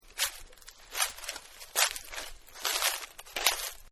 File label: Footsteps Leaves; Human